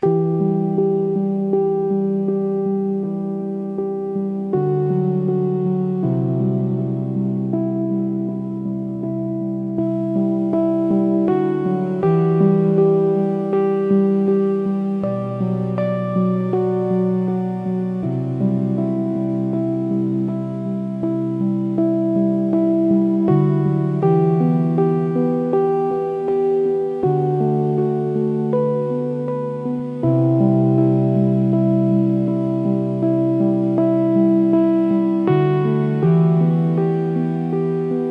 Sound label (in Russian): zvuk kolokolnogo nabata